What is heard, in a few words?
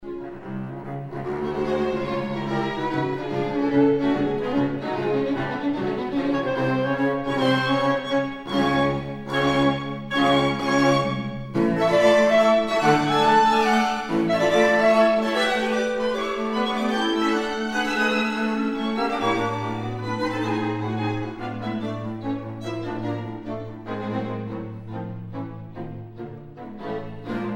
quintet; Coleridge-Taylor; 3rd Movt